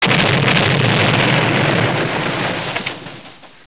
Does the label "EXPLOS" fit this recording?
Yes